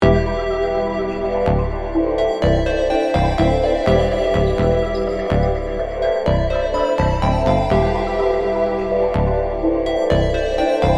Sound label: Loops; Drip Loops Kit